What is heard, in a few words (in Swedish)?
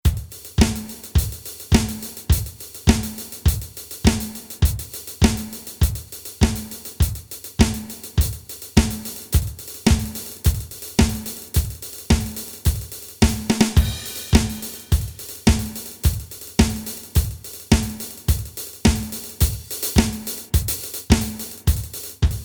Hi-Hat Trummor